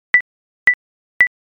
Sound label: ecg